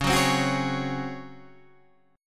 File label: C sharp Major 7th Suspended 4th, Guitar, Standard; strummed; chord; 9,9,10,11,9,9; JGuitar